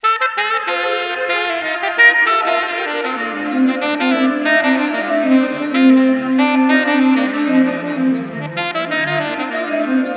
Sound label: Tenor Effect, sax; Effected; Sonic Foundry (Sony Creative Software); Jazz Solos and Sections